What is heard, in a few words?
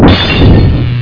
Hit-damaged screen